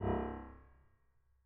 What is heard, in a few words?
harm C1, Grand Piano; bitKlavier Grand; Piano Bar